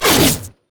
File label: swipe; nidalee